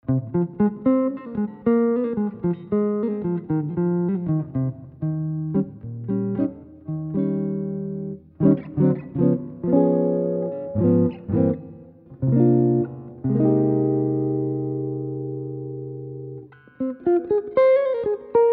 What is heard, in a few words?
Chord Melody; jazz tunes